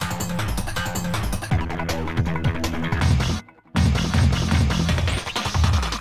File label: Action START; bolt 2008 pc gamerip